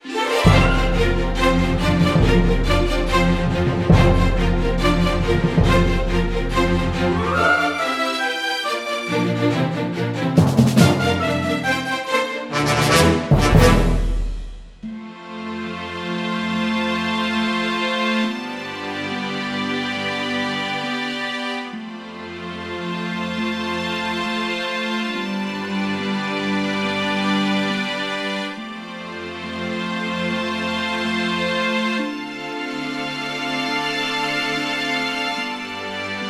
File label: Podium Win All Looping; Fable Heroes